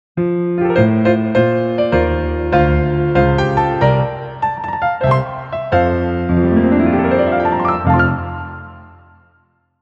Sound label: Jingle; Bayonetta Origins